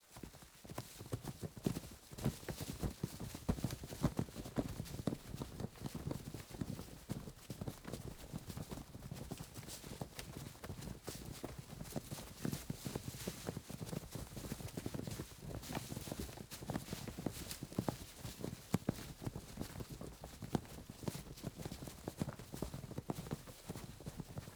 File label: EXT GROUP Battle Movement Forrest, Battle Crowd; Coll Anderson